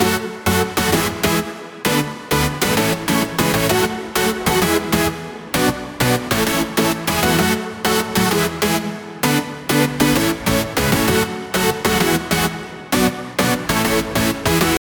Supersaw, dance chord progression, top triplet melody, F# minor, medium reverb, 8 bars; Audialab EDM Elements; 130BPM